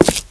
step, player; svencoop

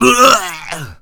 pain, Zombies; zps; Z Punk

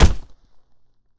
Push Hit; zps; Weapons; Melee

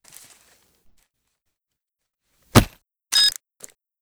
ied plant; zps; Weapons; Explosives

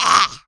attack, Zombies; zps; Z lea

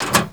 vending shoot; zps

